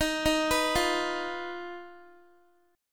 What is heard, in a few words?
D sharp Suspended 2nd Sharp 5th D sharp Guitar; arpeggiated